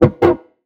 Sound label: D Bass